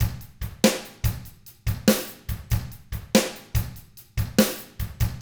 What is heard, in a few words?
rock 6/8, drums; ILIO; Double Platinum Drums 1